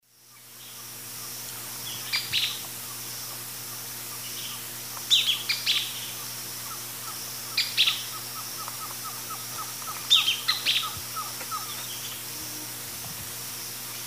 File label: Phaeomyias murina, songs 2 birds; S. America; Eastern Lowlands